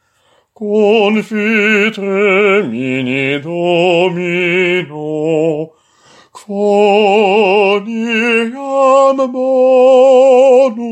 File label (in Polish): Zwrotka; Misericordias Domini